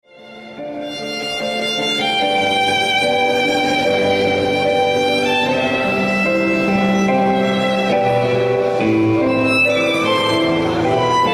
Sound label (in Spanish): instrumental